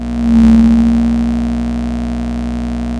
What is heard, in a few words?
Sound of a cruise ship horn